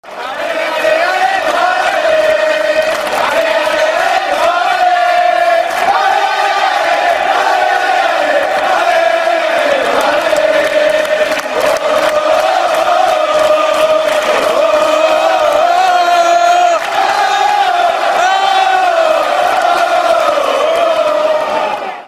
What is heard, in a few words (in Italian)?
Alé Novara Alé, cori curva nord